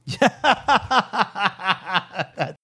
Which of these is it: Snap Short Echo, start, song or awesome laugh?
awesome laugh